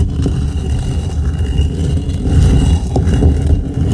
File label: Rumble Loop